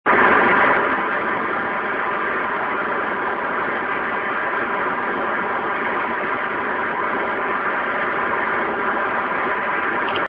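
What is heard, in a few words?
19130 kHz AM stancia, AM station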